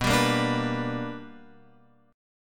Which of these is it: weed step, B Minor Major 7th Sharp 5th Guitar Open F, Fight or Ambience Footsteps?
B Minor Major 7th Sharp 5th Guitar Open F